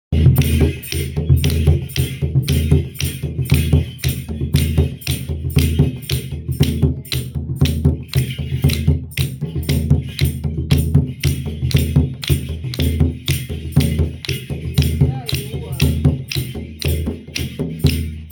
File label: drumming lessons; mahazi project